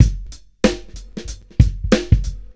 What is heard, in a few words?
Drums, Rock